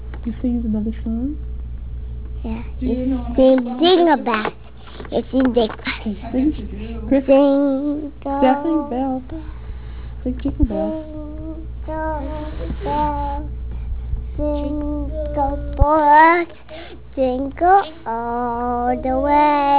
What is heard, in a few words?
jingle bells